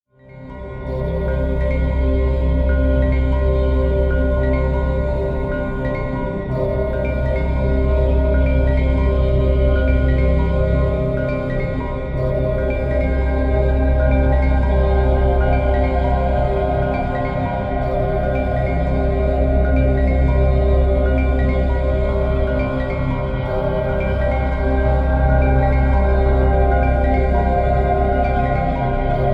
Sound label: Electro Soundscape